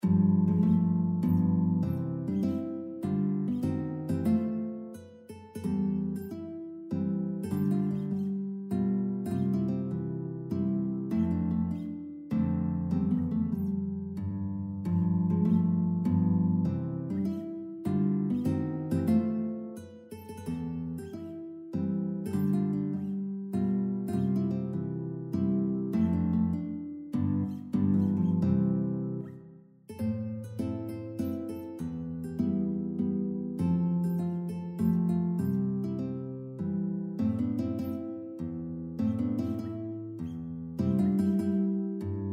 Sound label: sueno mazurka, guitar